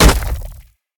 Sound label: staff hit; smite; ahpuch skin10